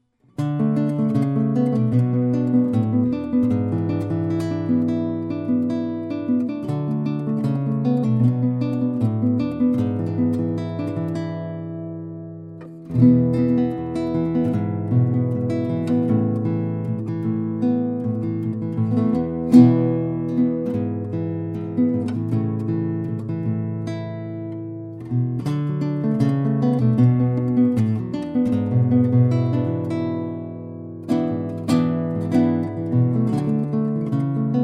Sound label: guitar